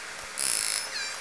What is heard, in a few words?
call, L pod, Orca; SRKW; Alwin